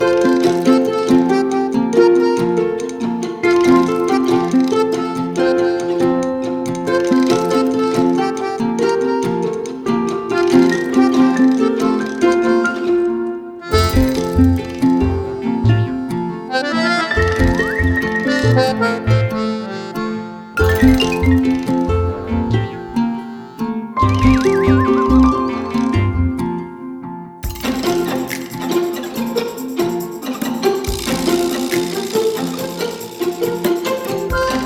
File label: default bgm